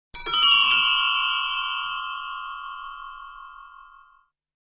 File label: Brass Chimes, Wind Chimes